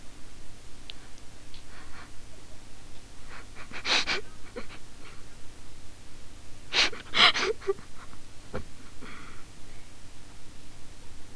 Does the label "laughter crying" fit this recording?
Yes